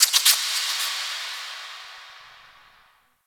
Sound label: Ocean Underwater Critter Small; Warframe; Grineer Ocean